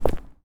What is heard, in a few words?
Personnel Footsteps Concrete; DCS